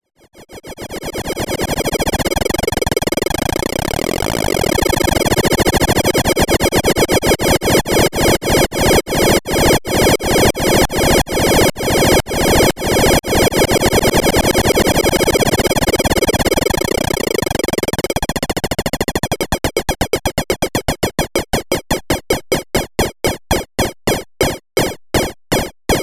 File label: Nano Modules FM LFO OSC2 OSC1